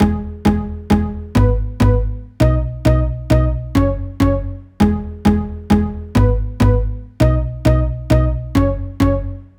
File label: Pop Synth Loop 100 BPM G# Min; Synths; Loops; Chord Loops; Cymatics; EDM Starter Pack